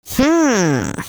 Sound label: China Miss Sound; Jolly Battle; Android